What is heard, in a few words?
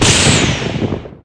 holy sword dash